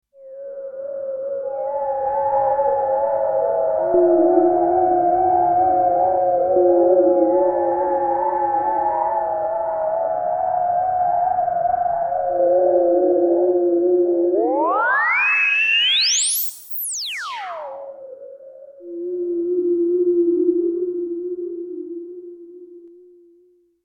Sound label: Alesis A6 Doing Akai like sound